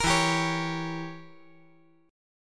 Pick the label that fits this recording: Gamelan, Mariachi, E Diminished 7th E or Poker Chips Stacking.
E Diminished 7th E